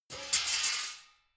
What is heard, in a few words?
metal sheet crash hard; metall